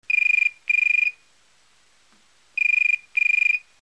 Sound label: phone residential